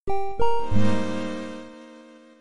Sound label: Fx Jingle Green Witch